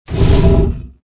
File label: stone ledge out; movers objects